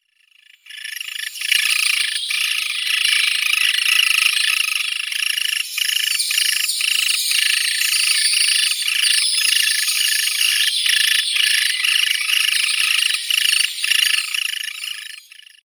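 three-toed woodpecker, picoides tridactylus, nestling; dyatel trehpalii; ptenets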